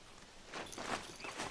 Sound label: crawling; mgs4